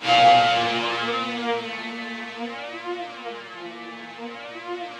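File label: rough strings A#1 left, Yamaha VP-1; 90 s Sample CDs; Music Store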